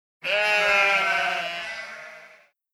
Sheep Baa

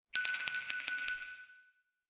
Bell Ringing

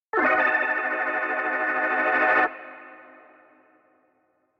Terrible Organ SFX; Christmas 2024 - We Three Spies

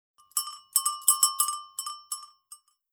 Sound Effect - Bell

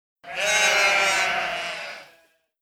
Sheep Baa

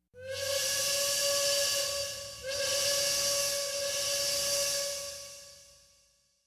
Train Whistle

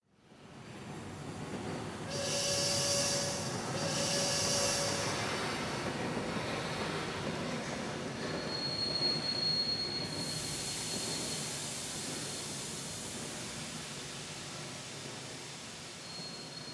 Train Arriving

